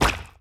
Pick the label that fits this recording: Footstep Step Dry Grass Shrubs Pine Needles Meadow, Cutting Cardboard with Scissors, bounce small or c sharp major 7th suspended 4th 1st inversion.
bounce small